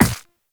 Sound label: Run Ice, Footsteps; Warframe; Operator